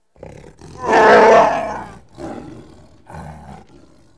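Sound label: Wookiee male attack; Star Wars Knights Of The Old Republic I; XBOX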